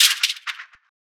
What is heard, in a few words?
Shaker; Dj Plugg Shaker